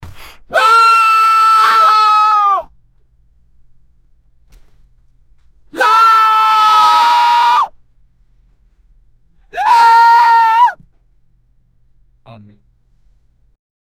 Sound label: mild fear scream of